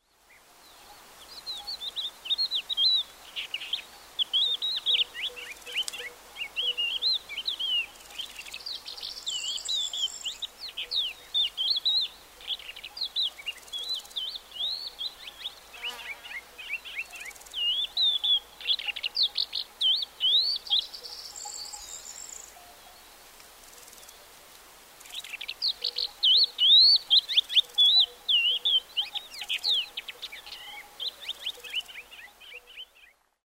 thekla lark

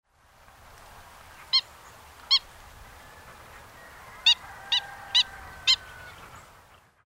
black winged stilt

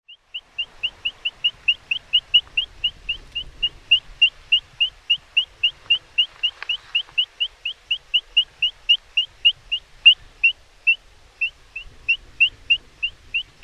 dotterel

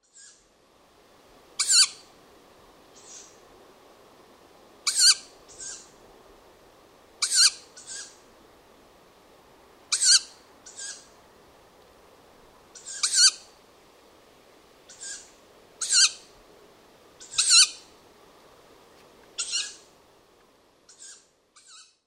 tawny owl

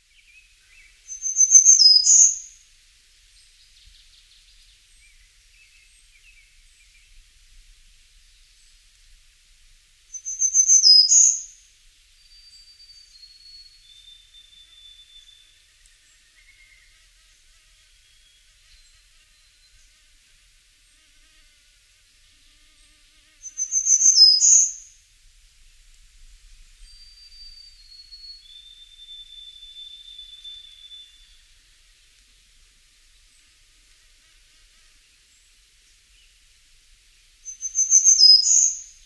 black throated green warbler

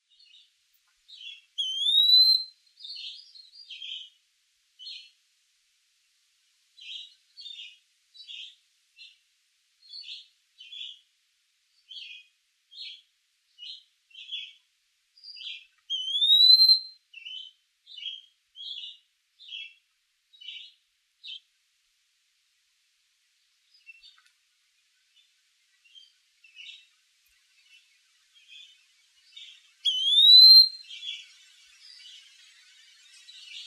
eastern wood pewee

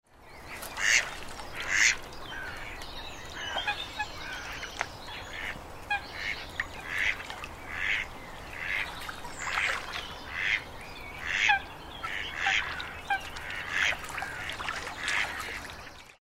mallard

